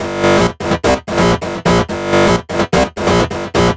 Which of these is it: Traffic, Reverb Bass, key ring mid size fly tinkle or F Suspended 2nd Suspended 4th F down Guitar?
Reverb Bass